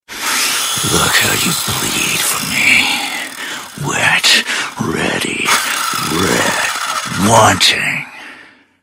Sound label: cannibal idle; frank